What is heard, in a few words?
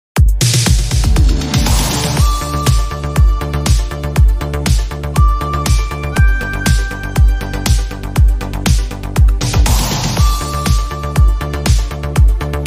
new italo disco; korgstyle